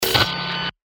PERC Niagra, percussion; revealed percussion vol 2

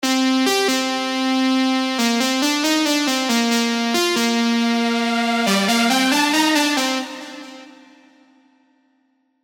Internal Choir; revealed serum 2 rave asylum

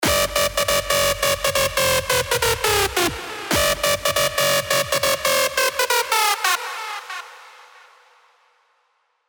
Screech, trance gates; Screech Soundgasm; Revealed Serum 2 Trance Gates Vol. 1

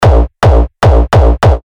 Kick A#; Slag; Revealed Terra Kicks

